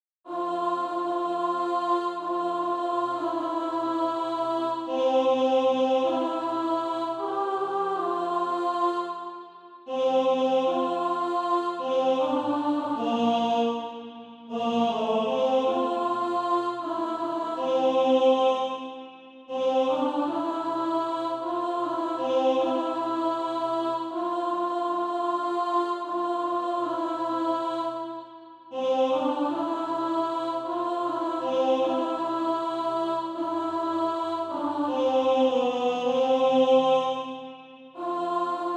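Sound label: alto, SATB Practise Music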